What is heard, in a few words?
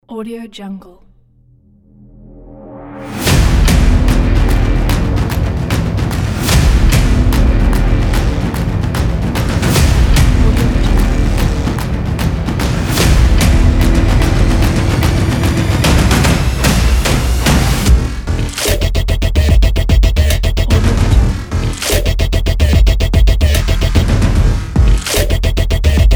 hybrid sport dubstep music